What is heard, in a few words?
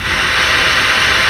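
Bass Clar p Air; Digital Sound Factory Virtuoso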